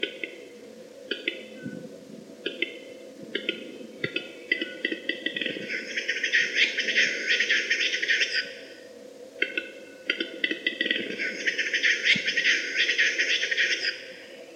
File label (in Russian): tokavanie samca gluharja